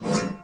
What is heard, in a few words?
ax heavy; insurrectionist